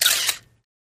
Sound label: 35mm Camera Auto Win